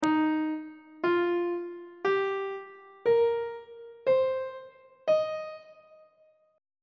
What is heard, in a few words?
e flat major pentatonic scale on treble clef